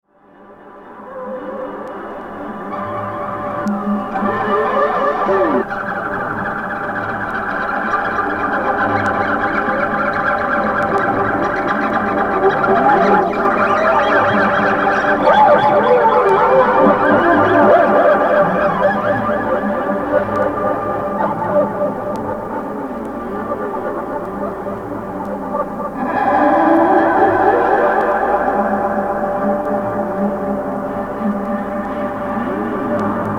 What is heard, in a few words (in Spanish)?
musica electroacustica